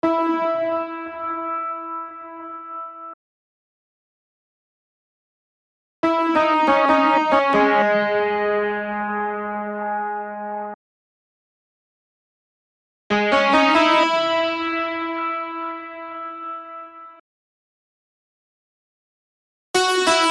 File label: dry guitar